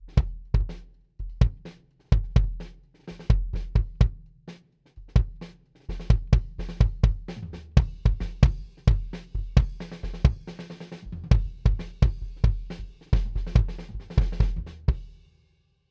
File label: Kick, Distance Blankets D112 Inside